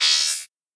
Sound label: arrow; yo frankie